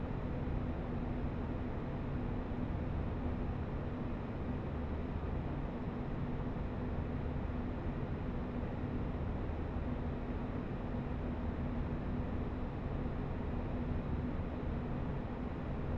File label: Ambience Lobby Base; starbites